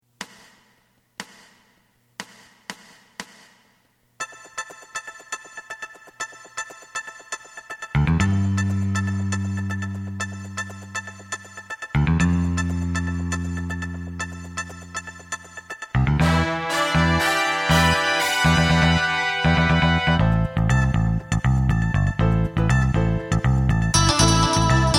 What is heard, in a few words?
drum